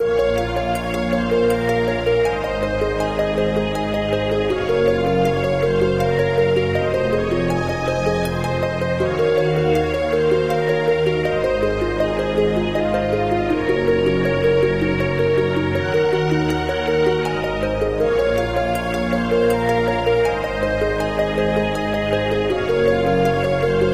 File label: slow; MapleShrine; mkxp; gameasync